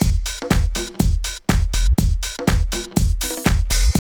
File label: house loop